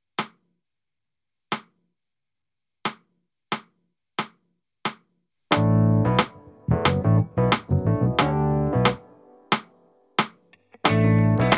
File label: Backing with click